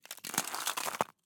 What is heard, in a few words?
ice thin crushed crust by foot; sonniss gdc